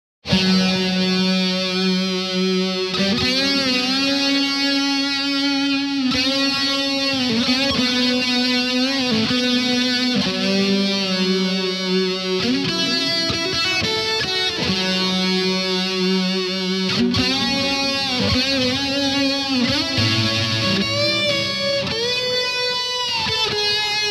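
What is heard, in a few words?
solo Tube Driver Before Sovtek Big Muff Reeves Bassman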